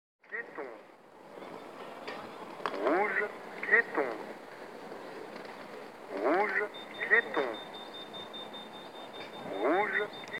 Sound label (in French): Feu rouge pietons